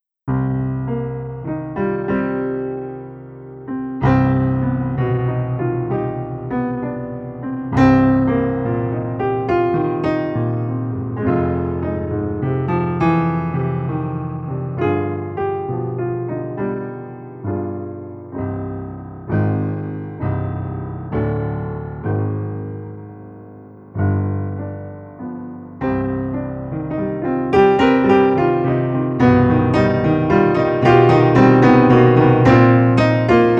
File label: Piano Lounge Am 105